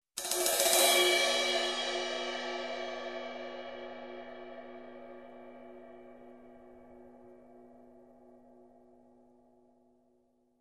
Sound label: Aggressive Cymbal Swell